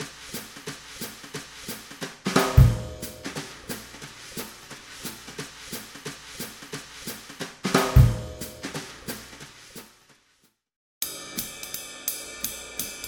jazz cats

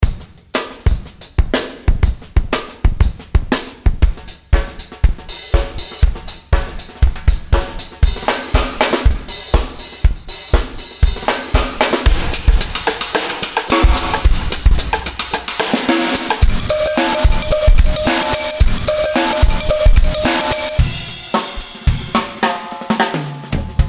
indie pop; indie pop foundations

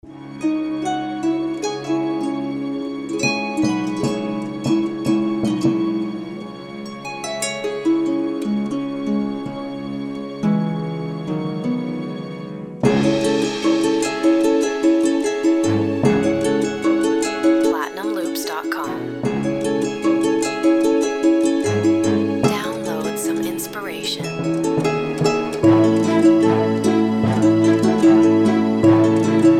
cinematic harps

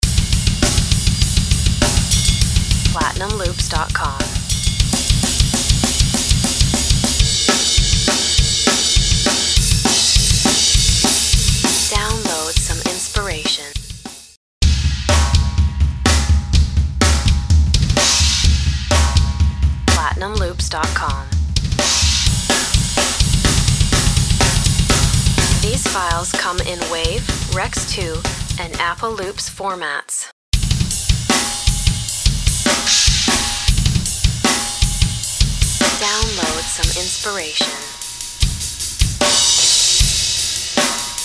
metalcore drums

